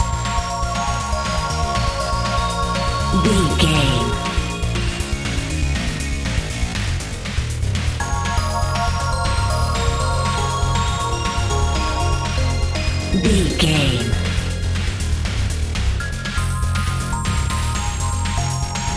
intense challenge video game

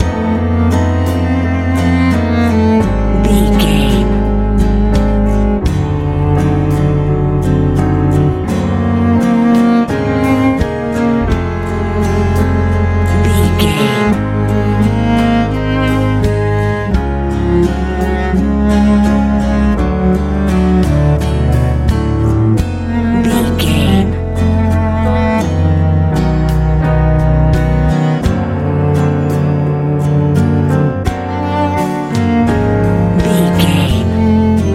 warm bright easy listening pop

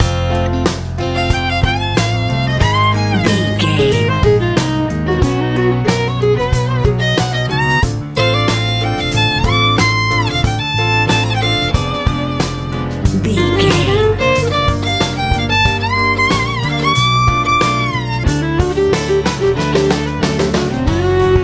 easy pop

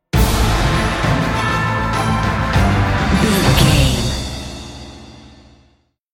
epic adventure sting bumper

